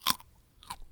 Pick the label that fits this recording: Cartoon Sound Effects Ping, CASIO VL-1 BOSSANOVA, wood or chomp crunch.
chomp crunch